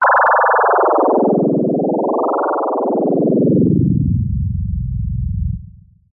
synth rocket clock robot two tone wobble power down long; Jessey Drake